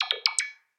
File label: construction robot; factorio 2.0.55